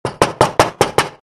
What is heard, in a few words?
Knock Knock